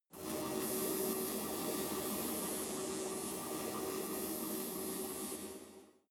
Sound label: space inside biomedical alien shuttle static